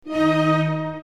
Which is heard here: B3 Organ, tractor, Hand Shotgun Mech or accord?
accord